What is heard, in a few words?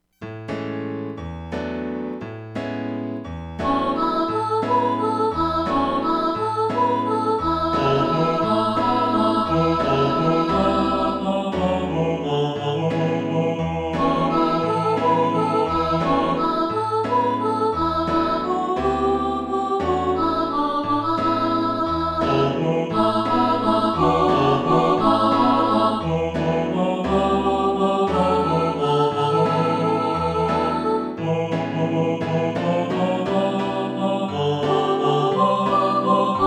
satb, choir